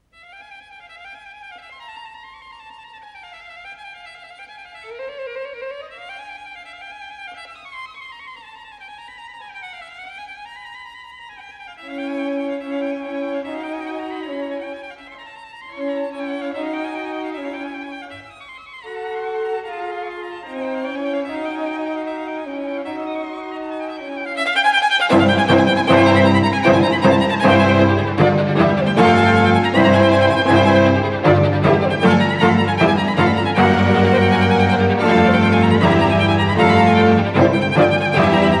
String Quartet, F minor, Etude. Allegro; chandos

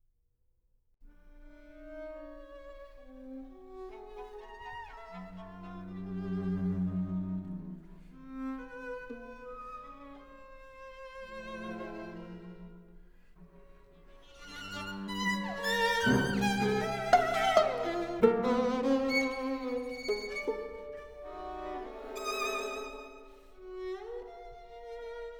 String Quartet, Mäßig; Six Bagatelles for String Quartet, op. 9

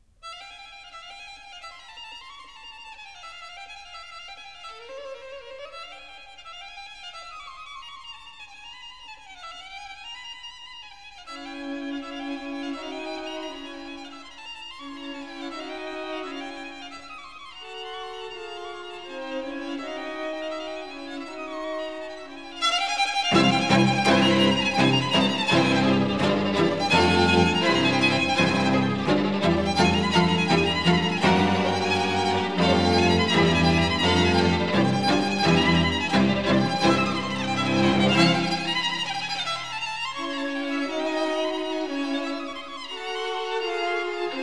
String Quartet, Etude (Allegretto)

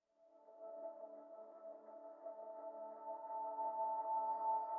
electronic music

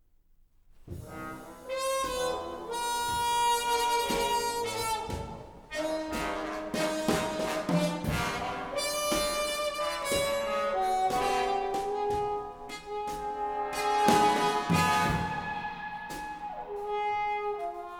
Slow blues tempo; Divertimento for Orch

